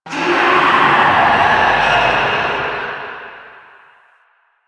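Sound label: Infested Distant; Warframe; NineteenNinetyNine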